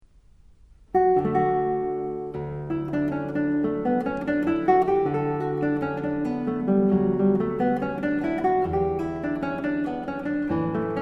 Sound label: allemande bwv 1007